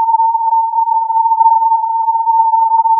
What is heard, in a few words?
filtered input